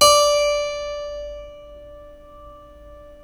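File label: D4 clavinet right, Keyboards Acoustic; USB Soundscan vol.17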